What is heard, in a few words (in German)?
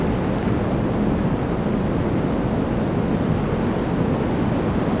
sonnenrauschen; elektrosound